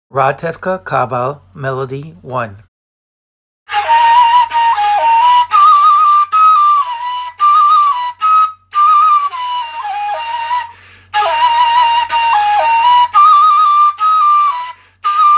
kaval; Ratevka

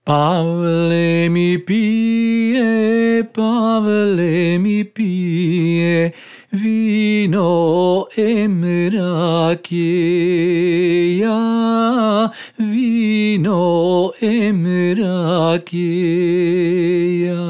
Pavle mi pie melody verse slow

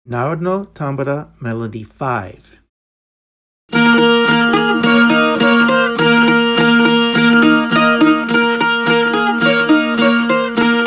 tambura; Narodno

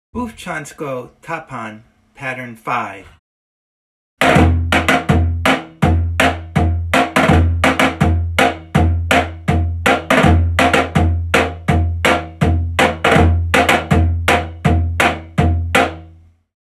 tapan; Bufcansko

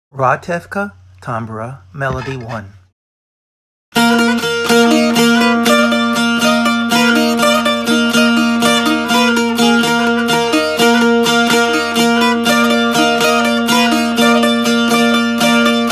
tambura; Ratevka

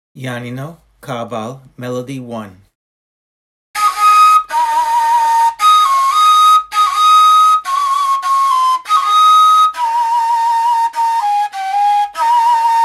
kaval

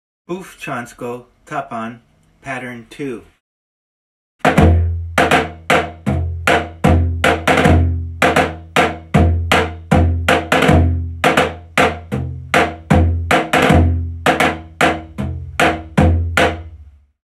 tapan; Bufcansko

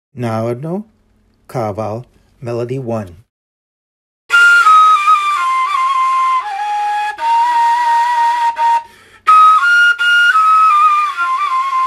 kaval melody; Narodno

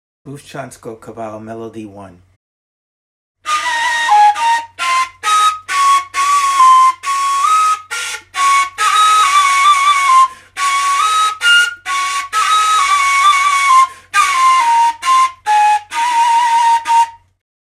kaval; Bufcansko

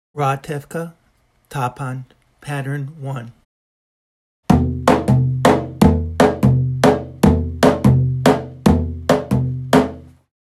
tapan; Ratevka

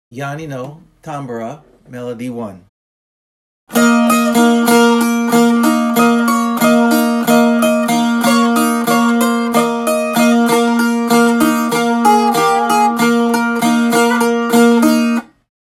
tambura

